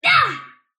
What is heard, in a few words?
sylph attack short; dota; dark willow